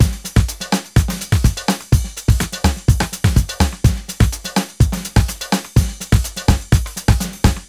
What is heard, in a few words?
Nova beat full, 125 Beats